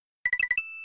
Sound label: multiple coins, collecting many coins at once; Super Mario World; Mario Coin Sound Clips